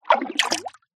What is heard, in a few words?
multimedia game designed water movement